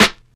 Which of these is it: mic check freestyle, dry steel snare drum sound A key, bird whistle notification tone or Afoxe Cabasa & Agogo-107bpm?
dry steel snare drum sound A key